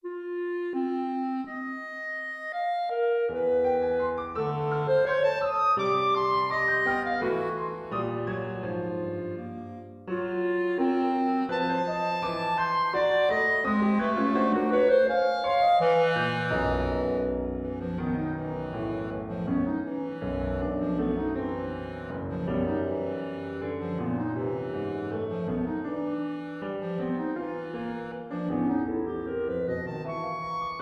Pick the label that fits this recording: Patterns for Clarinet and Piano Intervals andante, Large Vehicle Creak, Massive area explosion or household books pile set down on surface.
Patterns for Clarinet and Piano Intervals andante